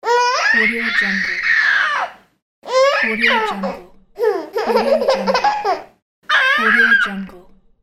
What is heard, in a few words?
baby laugh